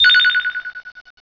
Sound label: SONAR